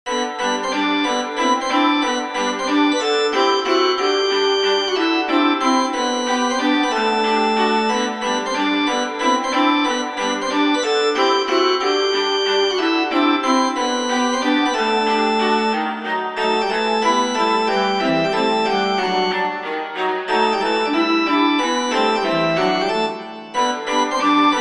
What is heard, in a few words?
Chiptune waltz